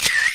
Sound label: camera shutter; voicemod